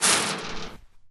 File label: chainlink, Footsteps; zps; Foley